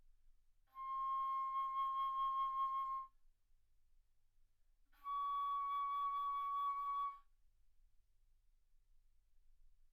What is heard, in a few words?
Bass Flute C6 Db6